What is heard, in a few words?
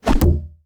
knife throw